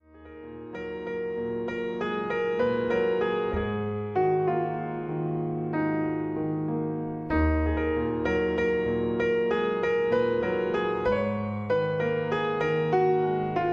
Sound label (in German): Schlaflied